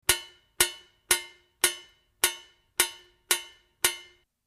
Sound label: Clang